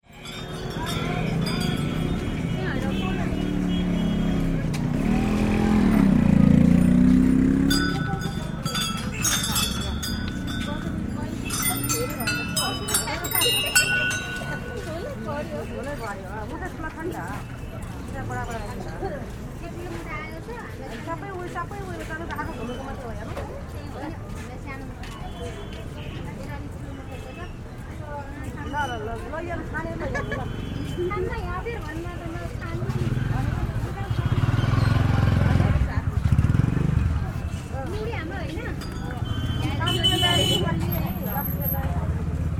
Ganesh shrine, nepal

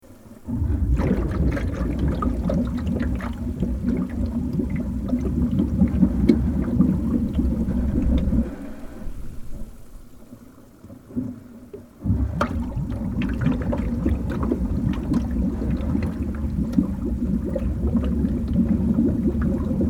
deep sea gurgle

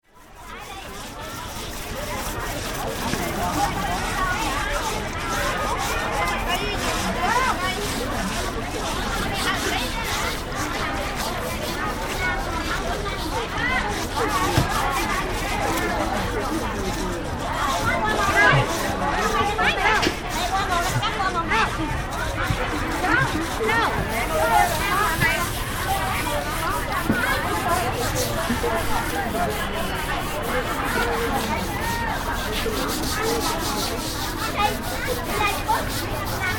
Washing, vietnam

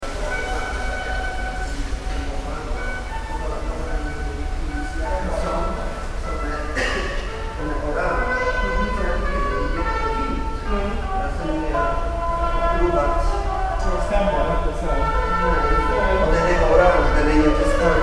stockholm tunnel

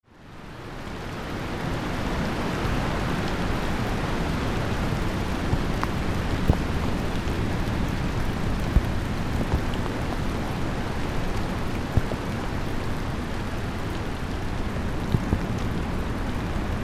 Muir Woods CA Perfect Storm

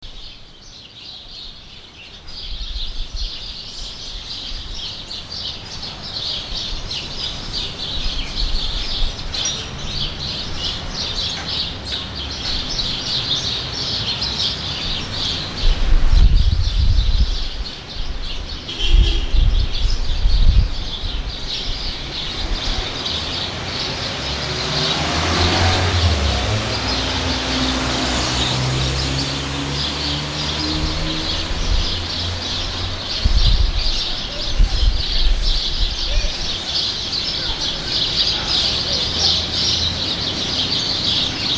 Buenos Aires birds

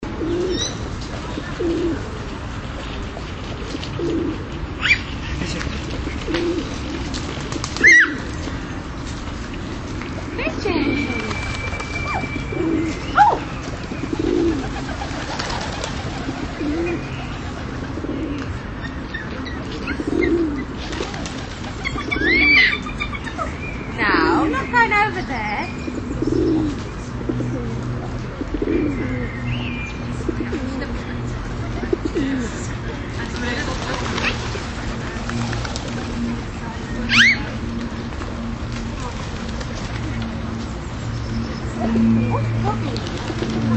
Victoria Park Pigeons And Child

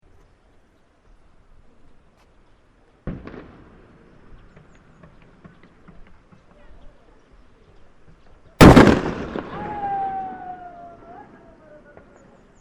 fire crackers, nepal

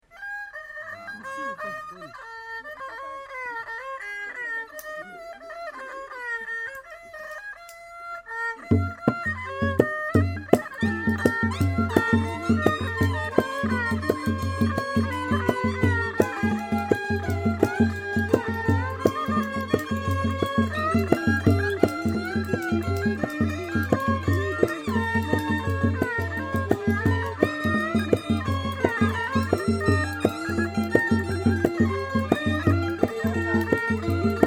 Banteay Srei musicians

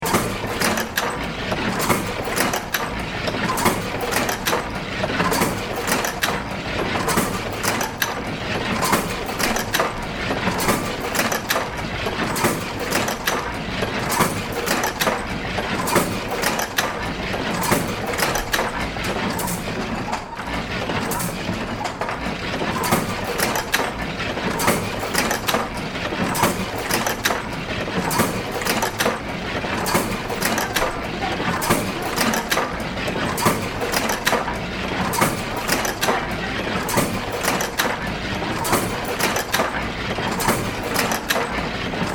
press; china

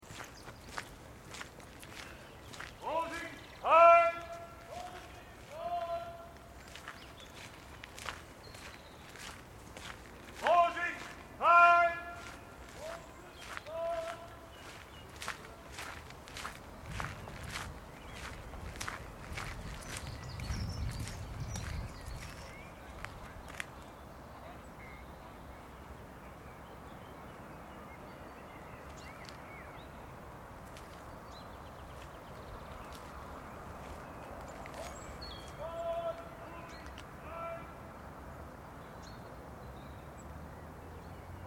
gardens

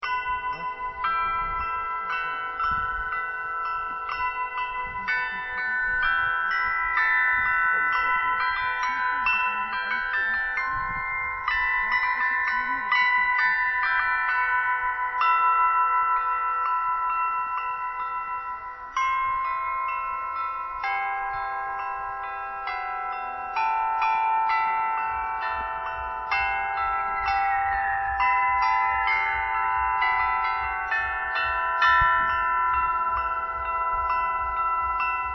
Moscow bell ringer

